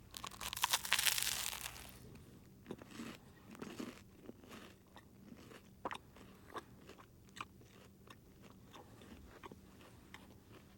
EAT, Eating; TheWorkRoom Audio Post

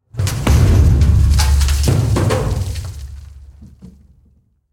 dry hard metal grind; Alexander Kopeikin; Black Metal

